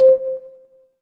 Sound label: feedback confirm